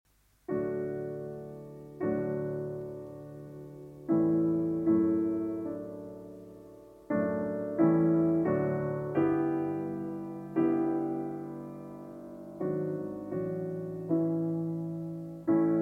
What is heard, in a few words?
Piano Trio No. 7 in B-Flat Major III. Andante Cantabile Ma Però Con Mo; Op. 97 Archduke